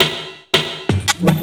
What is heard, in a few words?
LOOP SD, 168 BPM, Drum & Bass; Ueberschall; Drum & Bass Flash Trackz